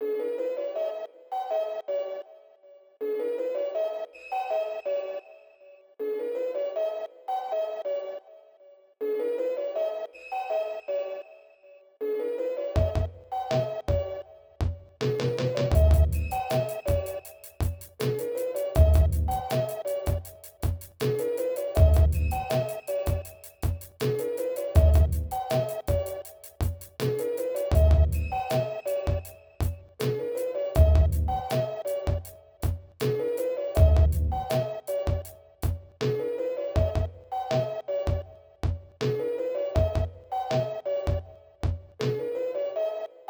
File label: sine wav beat